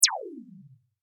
sound design hit descend futuristic short, Laser Descent; Sound Design - Futuristic Lazer Style Descending Hit; Descends; McKinney Sound